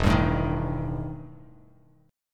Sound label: E Minor Major 7th Double Flat 5th E Bass Standard; strummed; JGuitar